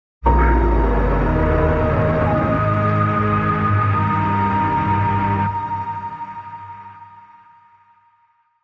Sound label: Underworld Atmosphere, Sting